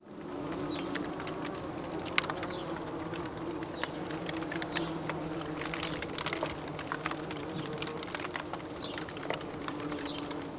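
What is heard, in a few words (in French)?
Abeille, Paysage sonore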